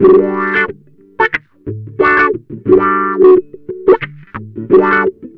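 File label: wah funk; Jazzistic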